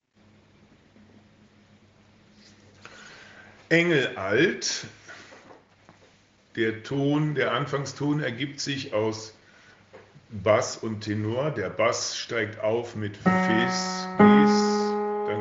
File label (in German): Engel Alt uebetempo; Uebedateien